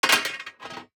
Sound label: DICE on Metal, Throw and Roll, Standard, Three Dice; Samples; ArticulatedSounds; DICE Sfx Party (Extended)